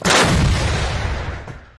pion 203mm fire

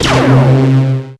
obelisk of light fire

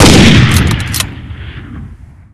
ramjet

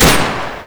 mac 31 fire

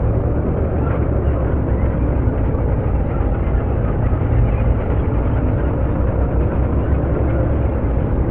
nod widow loop

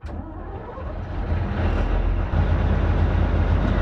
challenger 2 start sound

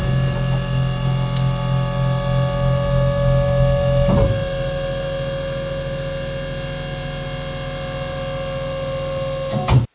turret rotate 36inch

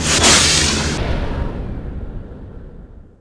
rocket fire

